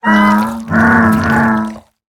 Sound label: Diplocaulus sound